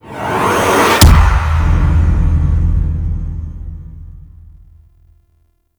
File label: Quake; Warframe; Decree